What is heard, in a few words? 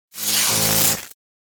Electric Arc Short Circuit Electric Shock; Hollywood Edge; sound designer's toolkit v2